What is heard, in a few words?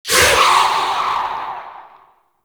Rifle Tail Indoor; Zariman Grn Wraith